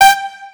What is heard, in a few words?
Leed Melody